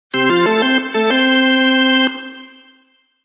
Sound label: Charge, chime; ring2 chimepro bundle